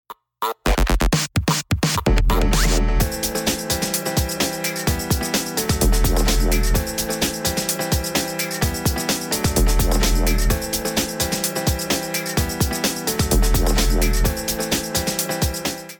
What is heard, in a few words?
Breakbeat; Curso Masterizacao para Musica Eletronica